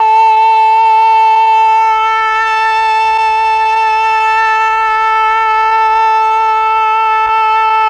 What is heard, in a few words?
OBOE; ILIO; Propeller Island Legendary M400